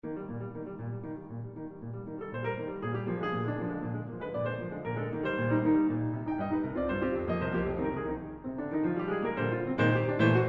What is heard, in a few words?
Two-Step